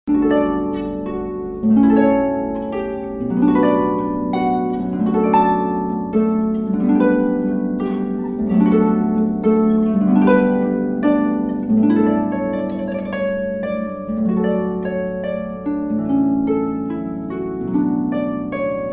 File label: Harpist; Houston; Canon in D